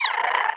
vox hungry growl, creatures, snark

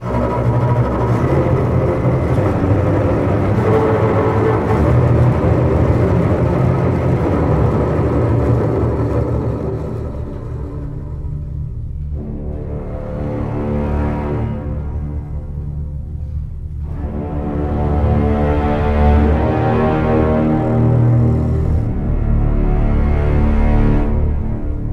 tanker arena intro, music; c17

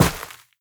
step run, footsteps player grass

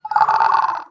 idle, headcrab reviver

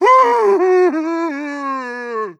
idle moan dry, zombie vox; creatures

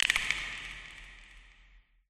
amb creature antlion click; Half Life Alyx

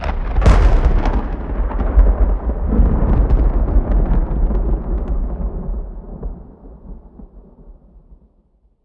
thunder close, rainscapes; Counter Strike Model Pack; CS:GO; ambience

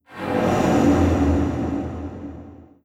fall wet, strider, combat, seq death; creatures

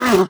grunt short, zombie vox; creatures

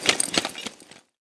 suit, footsteps

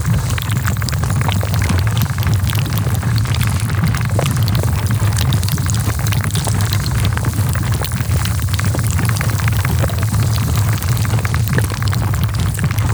body loop close, zombie blind; creatures

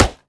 wood, bullet impacts; physics; Half Life Model Pack

